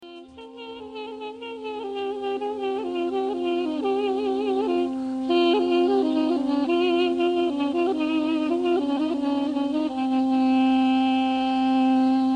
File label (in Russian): pesnya duduk kurdskaya muzika duduk kurdish